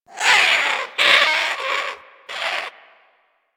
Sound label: Bird Of Prey Vocal Idle C; Warframe